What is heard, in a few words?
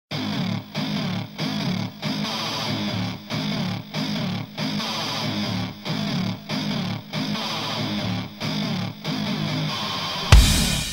instrumental cover